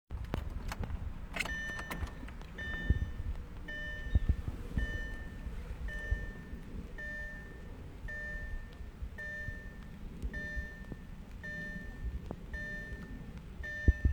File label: grinding noise start up